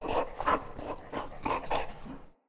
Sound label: Wolf Idle Open Mouth, Animals Wolf Vocals